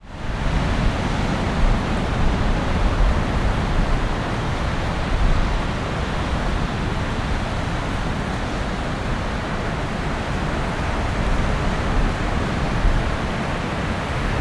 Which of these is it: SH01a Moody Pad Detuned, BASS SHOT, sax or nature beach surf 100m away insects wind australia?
nature beach surf 100m away insects wind australia